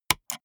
gaming mouse left click single hard; maschinen; buero

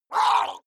water monster female gargle shout attack short; koerpergeraeusche; comedy-cartoon; soundpool

